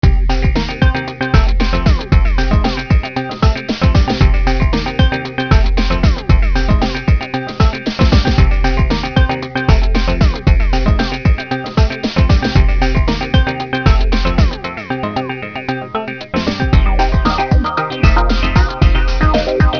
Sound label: funk